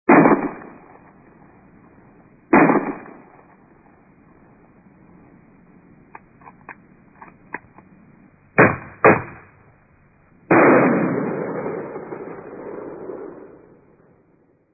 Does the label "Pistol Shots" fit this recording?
Yes